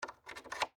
Door Hinge Pin In, Foley, Door, Hinge, Pin, Insert